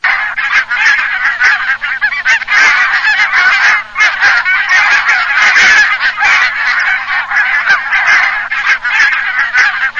OCA FACCIABIANCA

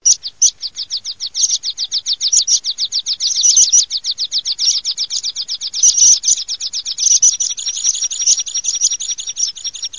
pispola spioncello

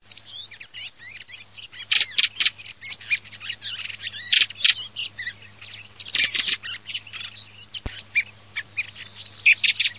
sassello

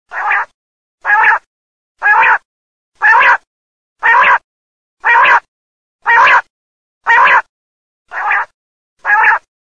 QUAGLIA MASCHIO

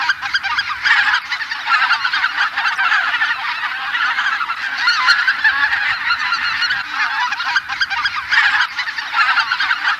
OCHE LOMBARDELLE

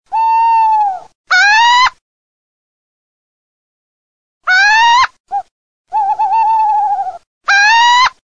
ALLOCCO CIVETTA

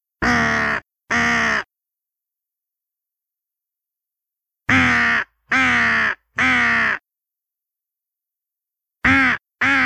Cornacchie

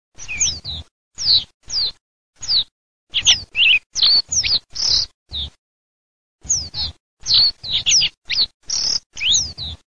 MIGLIARINI

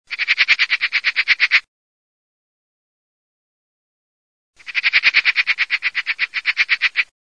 gazza

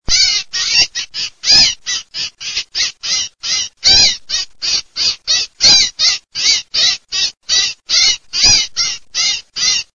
storno allarme

